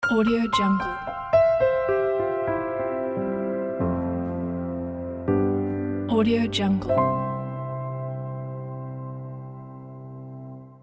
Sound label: piano logo